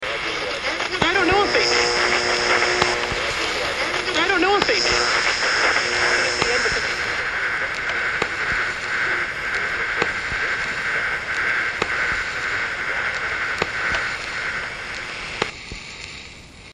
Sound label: Radio Static Tone